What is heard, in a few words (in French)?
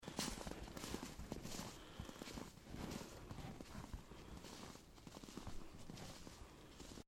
marcher dans le bois